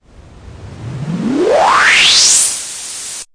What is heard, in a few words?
noise MiniBrute, Noise Sweeps